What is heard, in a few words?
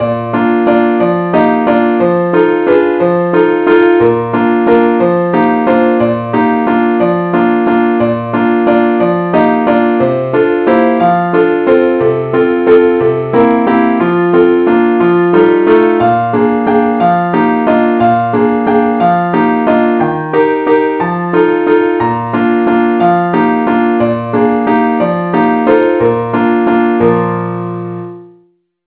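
advance accompaniment key es, keyb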